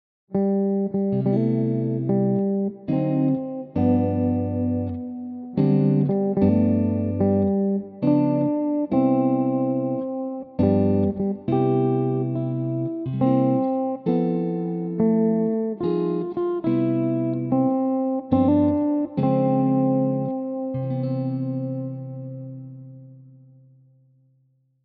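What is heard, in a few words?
Happy Birthday Re Arranging; jazz facile; riarmonizzare un brano col back cycling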